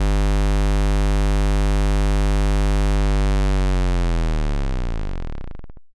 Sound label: Power Down